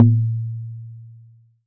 Jomox MBrane, drum machines; Jomox MBrane 11

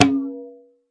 Processed Ethn Perc, ETHNIC and WORLD PERCUSSION

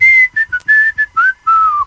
3 beat whistle loop; WORLD LOOPS

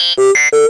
speak and spell startup melody

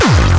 obnoxious kick

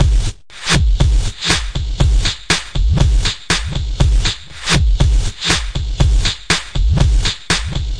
8bit touch n go, 120 bpm, drum loops and breaks

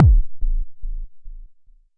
Jomox MBase, drum machine; Jomox MBase 01

